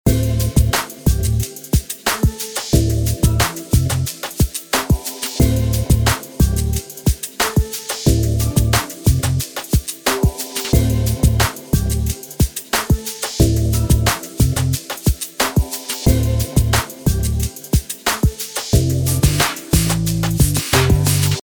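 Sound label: loop inspirational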